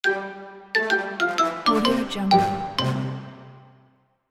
you lose

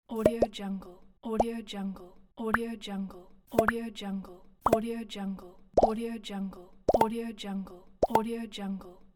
plop

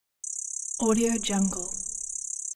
computer read out

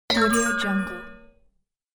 beauty jewels collect; fxprosound

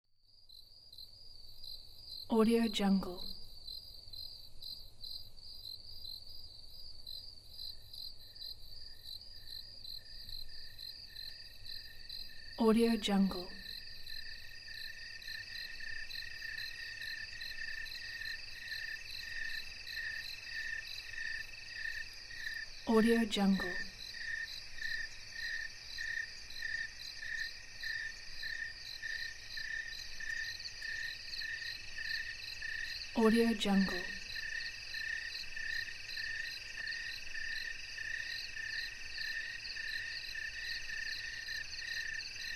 crickets at night; stereomarket

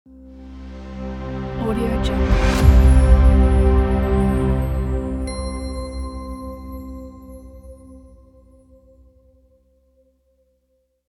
simple logo

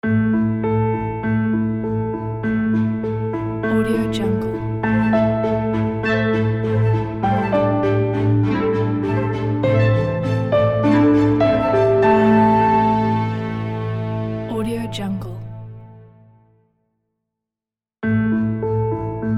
orchestral rising logo

